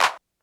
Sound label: Clap Standard; Lost Tapes From Mars; Clap & Rim